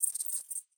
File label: Env Void Grasshopper